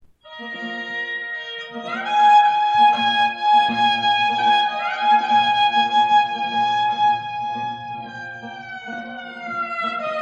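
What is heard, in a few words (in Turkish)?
kabak, halk çalgıları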